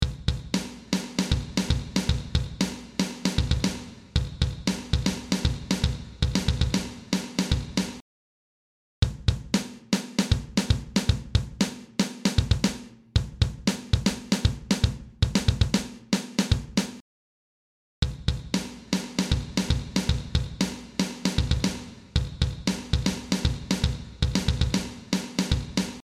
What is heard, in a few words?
Drums, Drum Room; Tverb; Preset: Drum Room